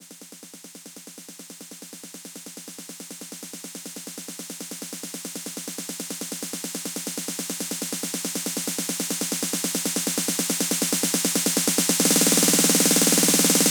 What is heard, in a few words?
Drum Techno Fill In